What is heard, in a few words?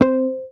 MUT C4, Bass; HAMER12MUTES